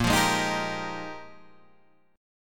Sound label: Bb 13th Suspended 4th; Bb13sus strummed